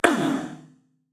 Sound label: Grunt Attack